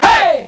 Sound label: 8 BIT "HEY", VOCAL